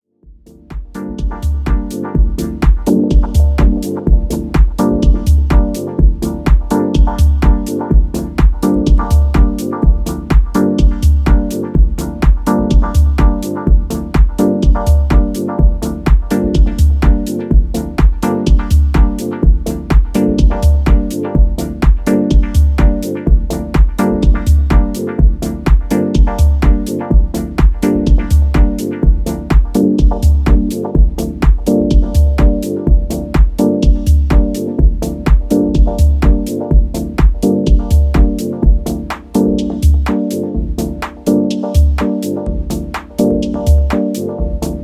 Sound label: dub harmonics; dub-techno